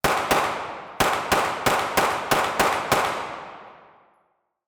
Dual 9mm Pistols Hand Guns Ambidextrous Shooter Gun Shot Fire; Nova Sound